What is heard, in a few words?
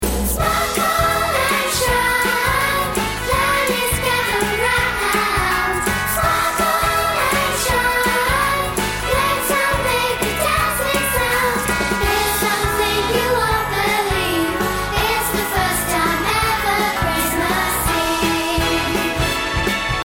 Vocal Guide